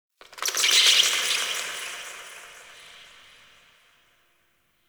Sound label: Forest Bats; Warframe; Grineer